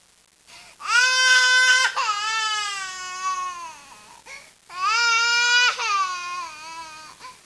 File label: crying loop; svencoop; hunger; thambs